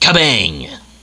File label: handgun fire; weapons